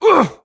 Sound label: horseman wound; svencoop; keenhalloween; horsemen